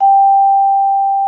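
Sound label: vibraphone G5; Xsample VOL-4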